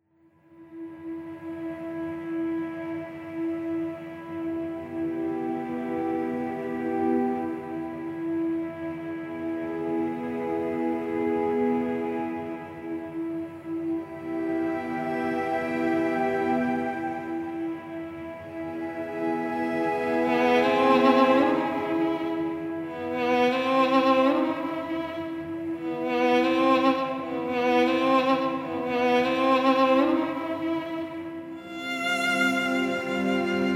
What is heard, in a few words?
Sad Lullaby; for Violin